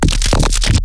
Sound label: weird bass